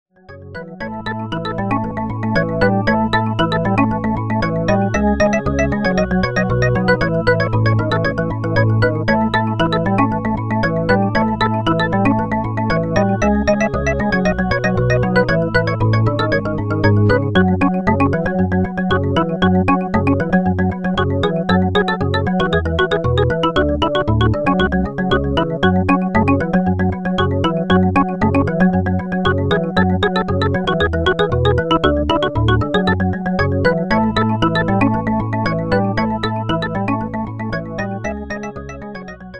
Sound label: organ mix